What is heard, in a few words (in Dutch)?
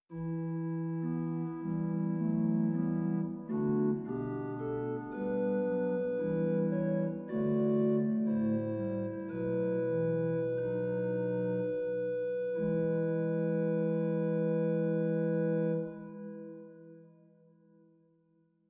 Rugwerk Roerfluit 8; zwolle